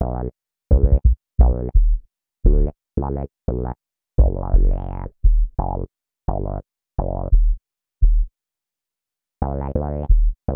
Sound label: Bass, Hip-Hop